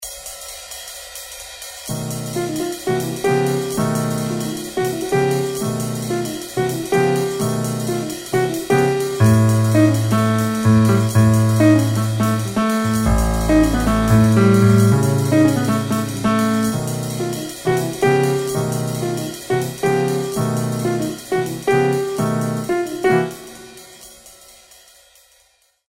blues in b

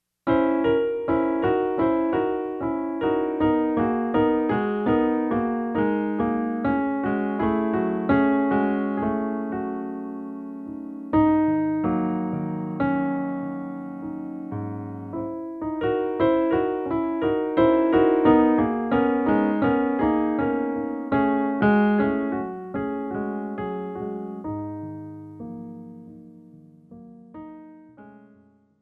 romantic phrase

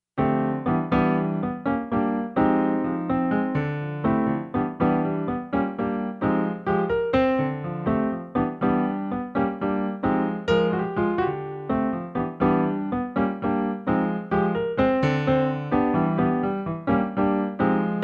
jungle blocks

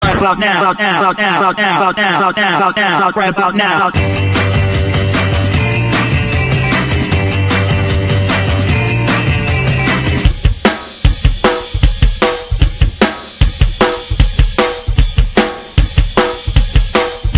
funk soul

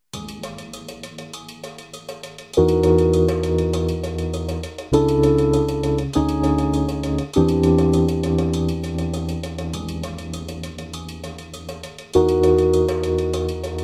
campfire fragment

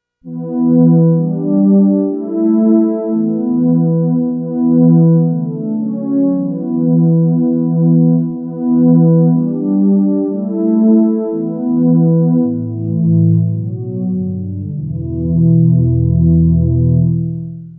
string quartet

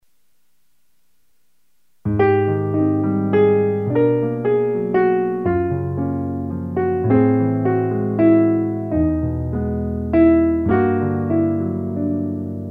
fragment of a minor progression

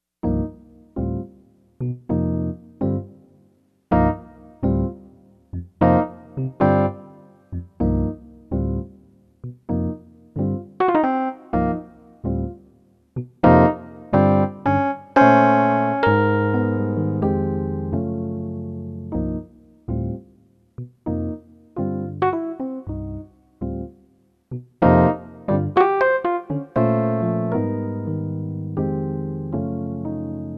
riff thing

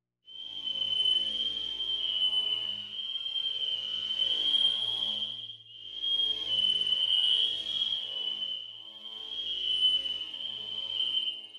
whistler

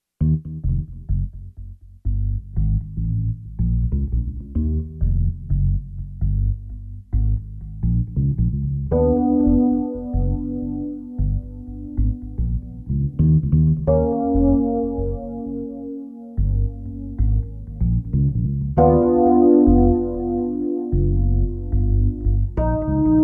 hidden trance

